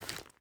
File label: generic magpouch pistol, weapons handling